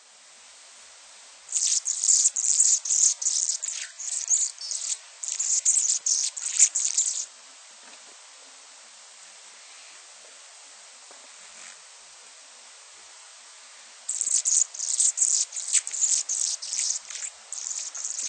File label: Anna's Hummingbird; Birds